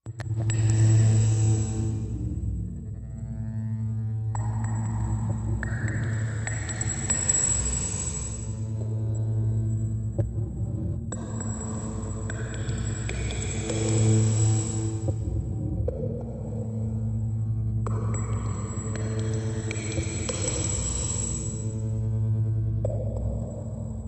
Radio Magnet, Machine, Ball Bearing Drops, Electric Buzz, Hum, Drips; power core hum